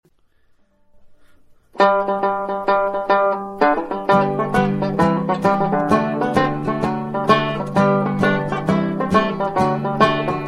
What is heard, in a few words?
hornpipe; murphys